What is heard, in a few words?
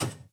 Aircrafts A-10 Canopy End; DCS